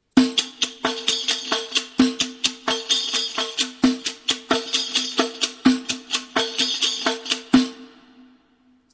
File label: riq